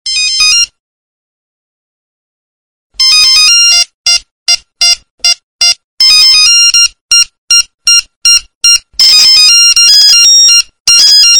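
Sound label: iphone 4s echo message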